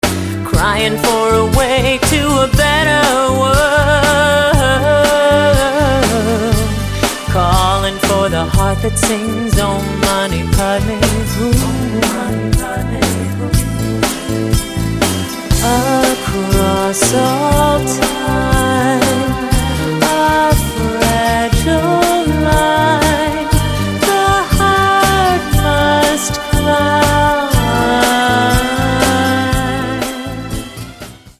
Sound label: Om Mani Padme Hum